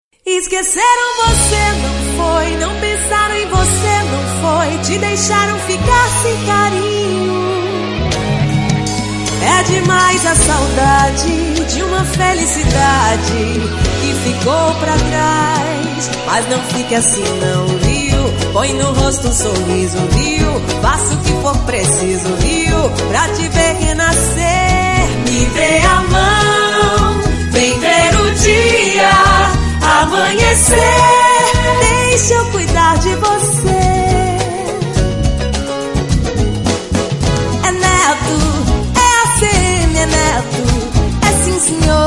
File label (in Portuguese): Jingle politico